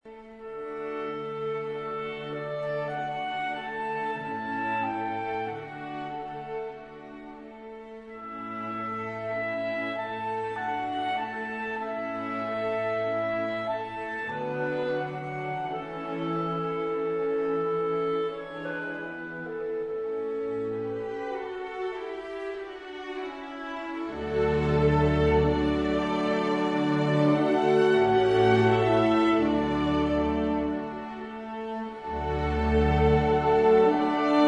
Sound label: Mozart Clarinet Concerto KV622 Adagio